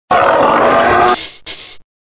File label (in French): Cri